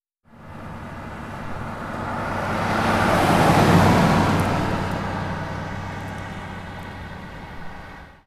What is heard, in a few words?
Car passing